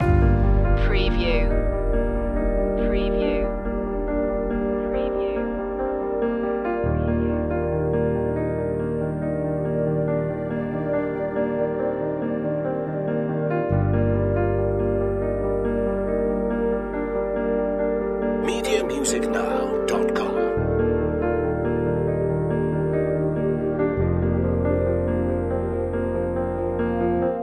touch the sky loop

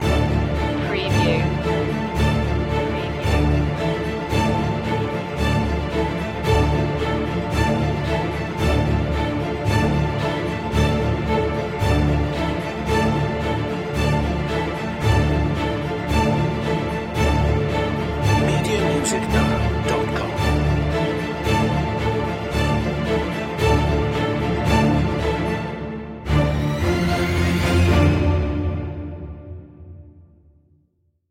strings mix

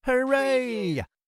Hurray; beanstalk audio

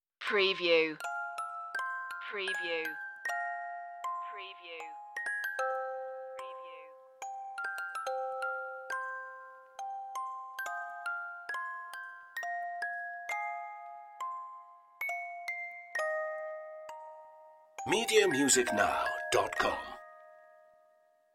music box machine end

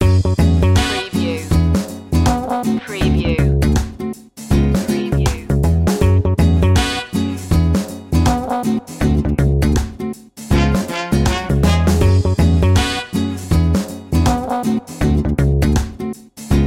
spanish mood loop

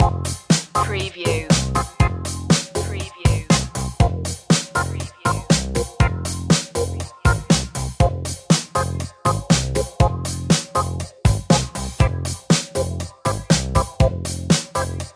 electrowave loop

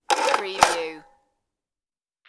engine start belt

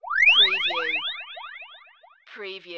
Multimedia Alarm

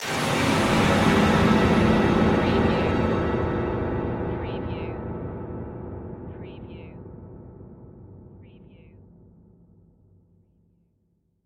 Stab Dark

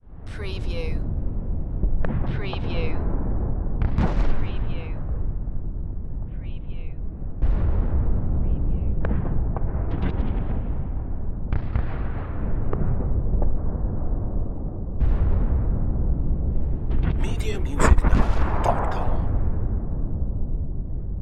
Explosions Battlefield; Sound Effects Bible

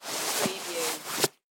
Cloth Glove Hand Insert

